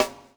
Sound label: SLICK, Backbeat